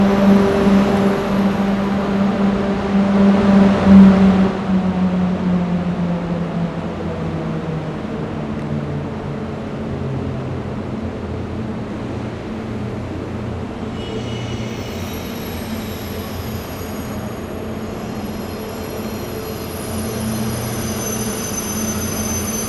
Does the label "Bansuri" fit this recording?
No